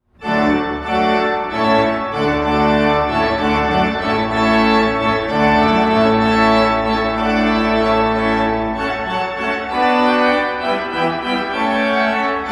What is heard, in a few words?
Hornpipe; from Water Music; Handel